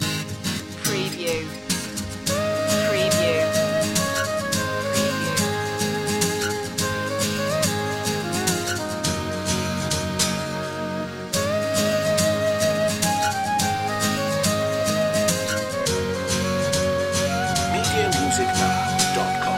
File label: guitar